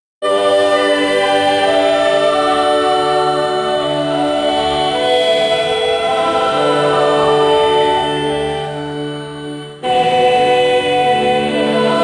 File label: cheerful